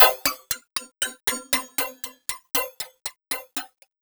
ASIAN MELO; USB Soundscan vol.33; AKAI; Disjointed Textures; RYTHME

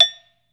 SARAN; Metalophones; E-MU Producer Series Vol. 7 – Old World Instruments

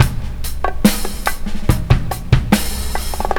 Bongo Butt 71 bpm, breaks; Zero-G Beneath the Planet of the Breaks

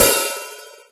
Boss DR-202 hat

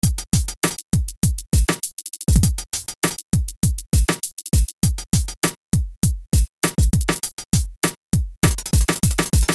Beat

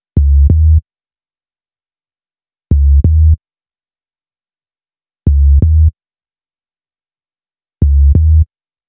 Bass, Hip-Hop

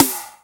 Misc Snare; Mo' Phatt; Digital Sound Factory Mo' Phatt

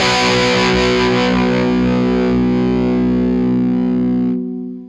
GRUNG SUS4, Guitar; 90 s Sample CDs; Quantum Leap - Guitar & Bass

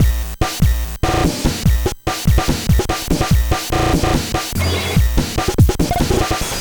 NES Drum IDM Loop, 8-Bit; Nintendo Entertainment System; Drums; Chiptune; Sonic Foundry (Sony Creative Software)

